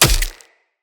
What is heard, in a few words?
Short Sword Medium Impact Flesh